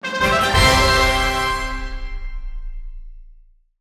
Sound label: Level Up!; Ever Oasis